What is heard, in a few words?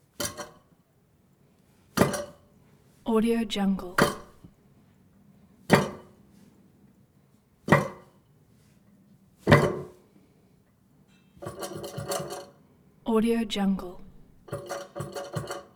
toilet handle jiggle bump handle